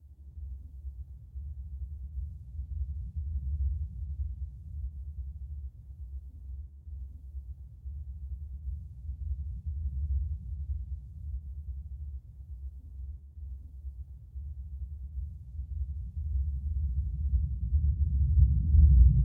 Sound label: low rumble; Blind Drive